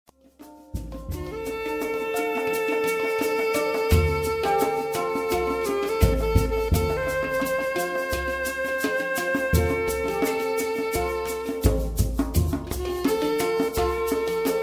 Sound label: Hang Hang